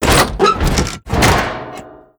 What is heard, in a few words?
open, gravity glove machine; mechanical units